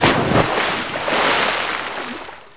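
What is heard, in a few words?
water splash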